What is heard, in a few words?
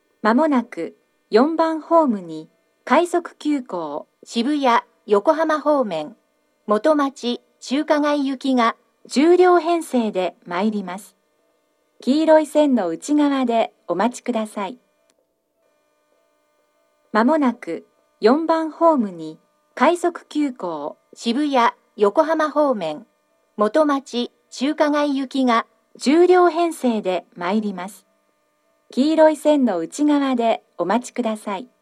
rapid express motomachi chukagai; irumashi; station